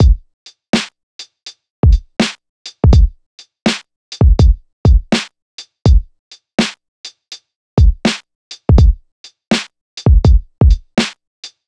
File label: swing loop; Hiphop